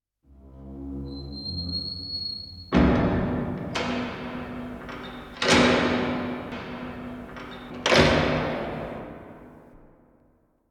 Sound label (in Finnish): Vankilan Sellin Ovi Kiinni Jail Prison Cell Door Closing